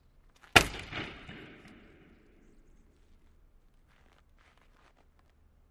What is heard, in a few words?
Hockey Player Stick